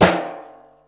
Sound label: barasim tak, Yamaha Motif ES drum machine; 300 Drum Machines; Yamaha Motif ES-Series